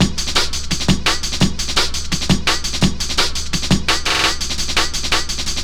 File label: Sweet Beat, Drum & Bass; Fluid Dynamics